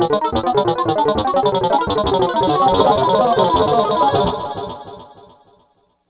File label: techno machine